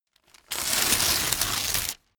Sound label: tear